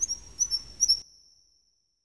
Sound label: Forest bird; ImpGal2; colony